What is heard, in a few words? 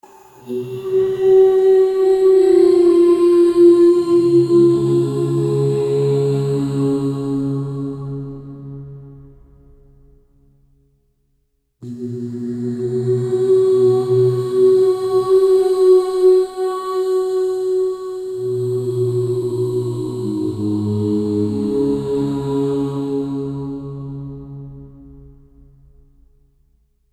the sound of a deity